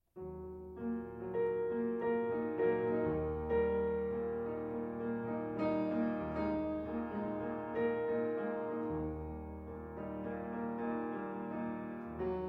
Cello Sonata in G Minor; Op. 19; III. Andante

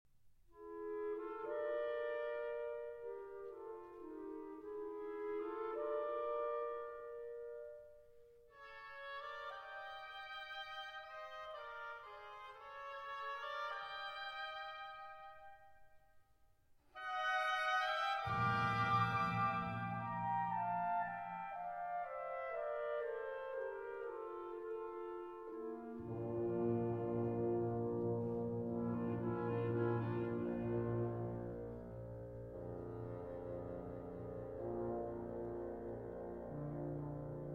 Concerto in D Minor for Violin and Orchestra

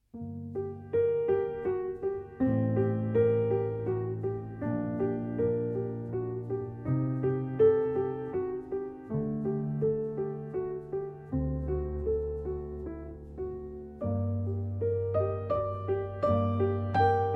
Siciliana, Flute Sonata in E-Flat major

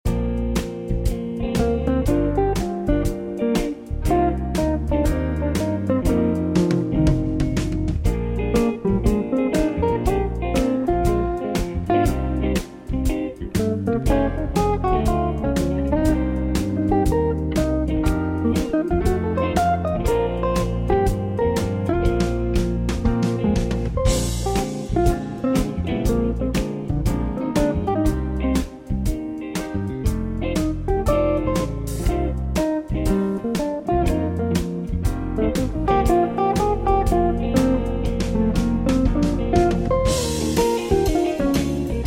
Blues

